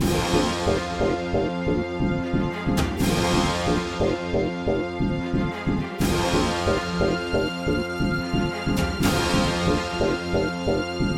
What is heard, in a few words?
battle boss; reine rouge